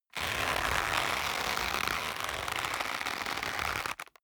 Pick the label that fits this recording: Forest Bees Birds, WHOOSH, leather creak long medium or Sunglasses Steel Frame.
leather creak long medium